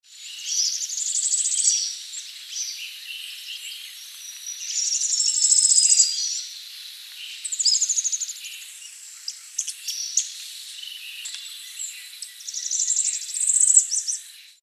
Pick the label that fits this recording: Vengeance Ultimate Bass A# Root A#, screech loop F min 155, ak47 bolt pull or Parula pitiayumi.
Parula pitiayumi